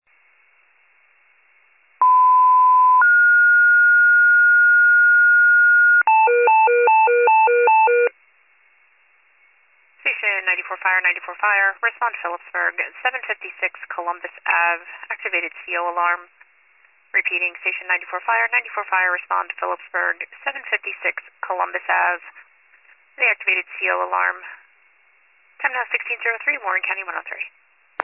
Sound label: fire, pager alert